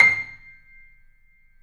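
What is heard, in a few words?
piano, C, left; InVision Interactive - The Piano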